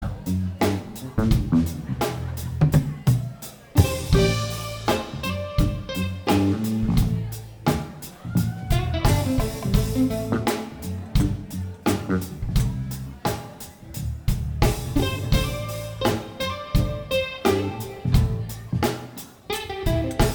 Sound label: Slow Steady Funky Build